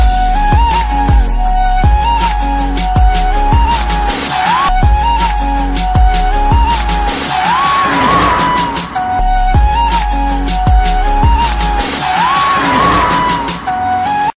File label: special ringtone, english ringtones